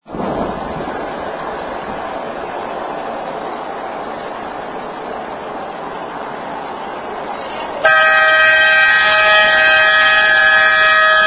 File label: All Clear Horn Pattern